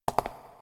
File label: cave grounds land; Golden Crayon Sounds